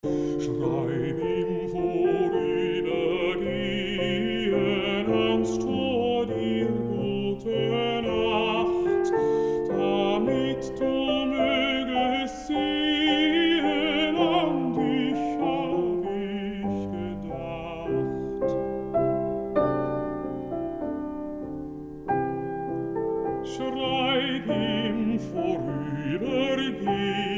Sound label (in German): Vokalmusik